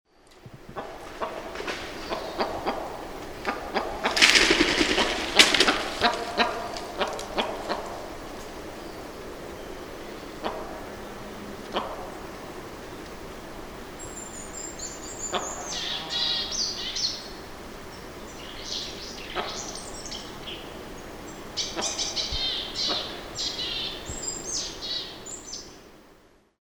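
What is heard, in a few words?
sounds of capercaillie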